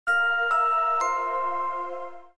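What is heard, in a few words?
power off; LG KH5800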